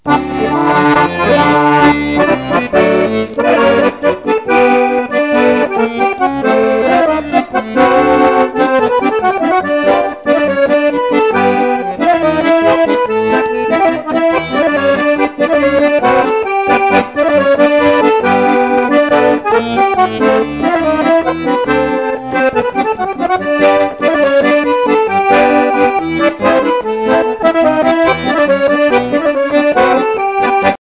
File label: swiss, accordions